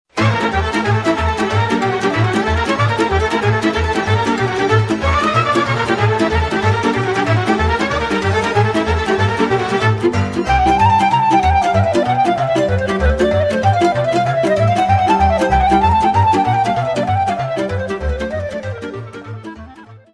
Sarba - Roumanie

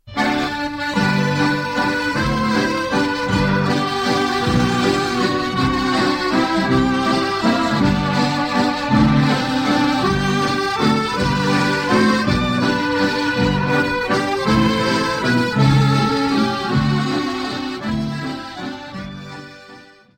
Schottish Waltz - Ecosse

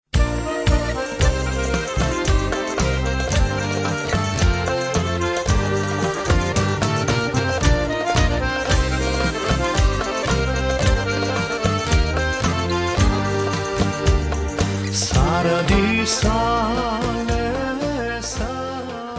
Arnautsko, Bulgarie

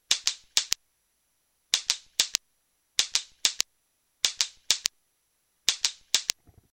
fouet; instruments

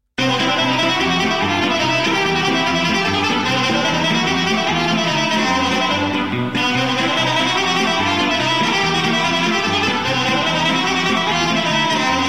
Ardeleana, Roumanie

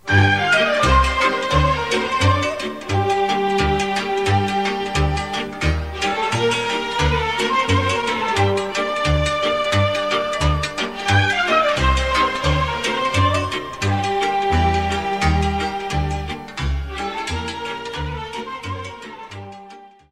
Hora lautareasca - Roumanie; Branesti

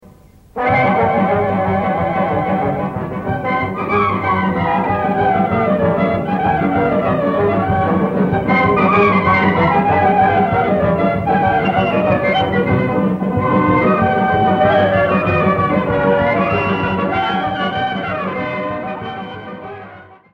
Sirba - Roumanie; Sirba Ofitereasca 2

